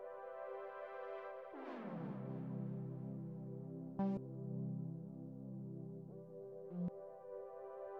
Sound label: Trance